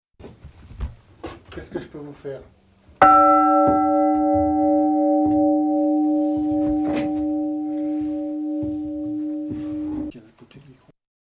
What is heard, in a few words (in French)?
cloche; Les Piards